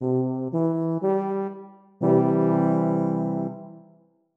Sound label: triad Major C3